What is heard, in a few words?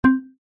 lose